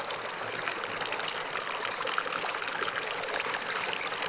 waterfall small loop

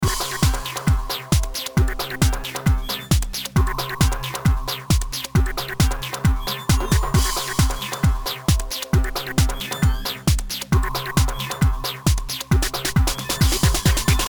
Techno; color cross nds gamerip

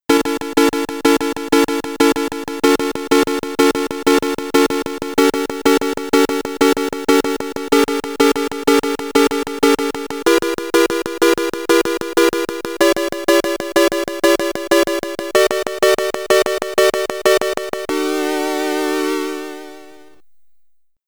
Intro, SID Stereo

